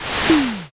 fire magic

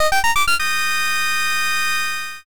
fullcombo

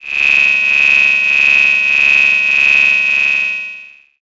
cicada

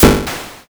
thunder magic

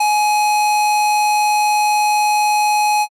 ecg flat

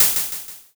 open hat

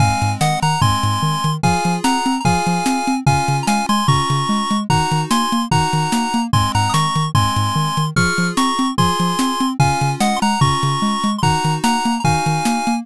bgm street

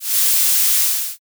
snake hiss

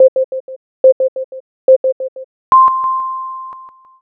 jiho

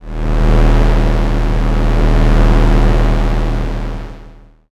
space engine

